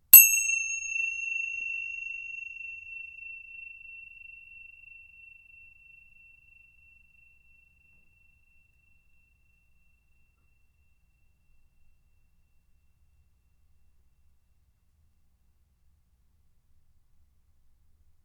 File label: tingsha